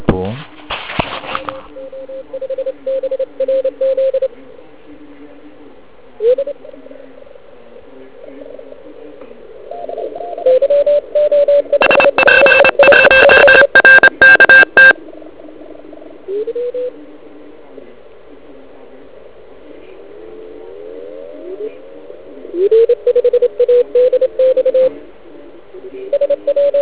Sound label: Scan cw; KX3